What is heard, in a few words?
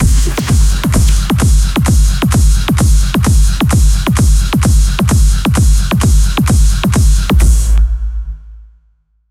tribal dance with heavy d